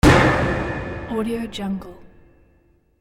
metal bang strong; hollywood edge

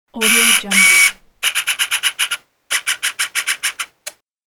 photo camera auto focus; fxprosound

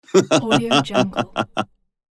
happy man laughs

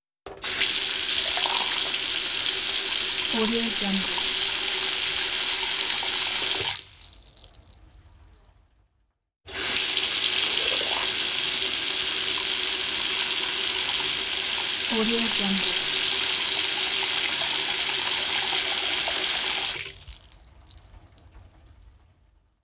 water flows into sink

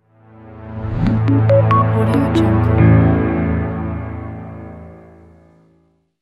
clean logo

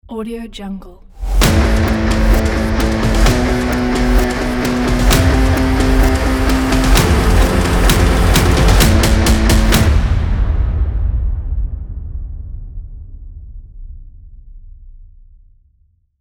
rock trailer ident

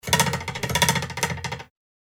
cartoon spring

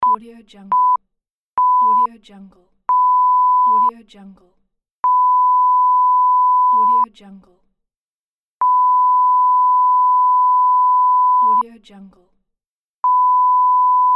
censor beep

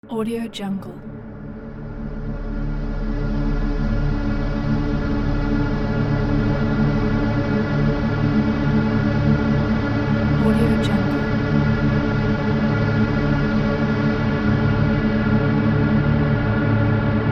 atmosphere soundscape

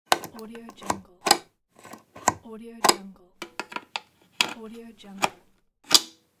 electrical socket plug and unplug

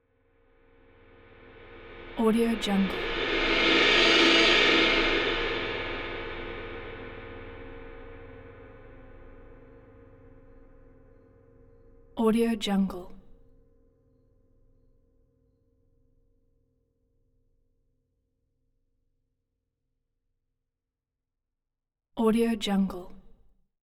24 ride bright finished

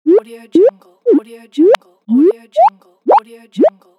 jump; rawaudiolab

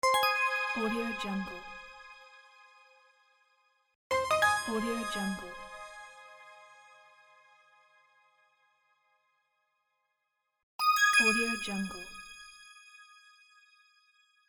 notification bell

